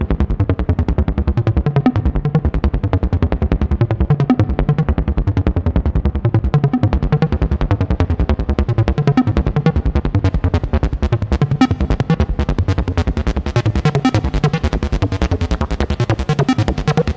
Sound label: MC202 Bass Loops